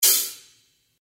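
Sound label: open hat, drums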